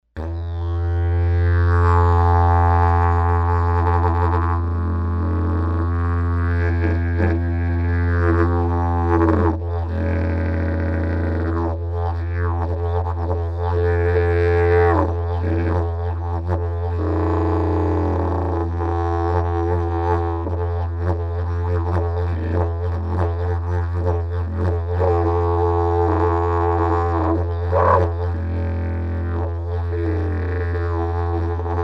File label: Didg Fa 4; Rouge